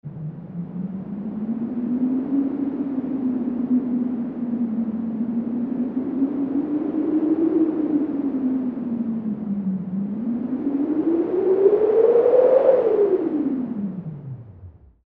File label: sedaye bad; Vocal&Effect